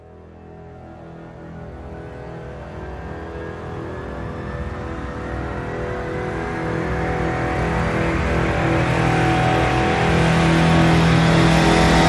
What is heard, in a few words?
Rise Long